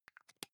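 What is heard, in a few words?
deodorant can lid close soft, badezimmer; haus-garten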